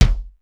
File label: loose kik; sr16 bd